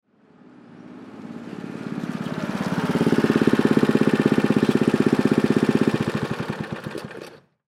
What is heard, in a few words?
Go Kart Pull Up, Go Kart Pull Up Shut Off; From Right; Go-Carts; Vehicles